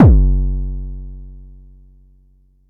Bassdrum, Jomox Xbase09

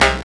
SYN DANC SY, dance; Sound & Vision - Gigapack II CD 1

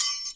Kitchen Foley Dry Drums; Antidote Advent; Kitchen Foley Sounds & Loops

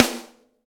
SD, Real Kit

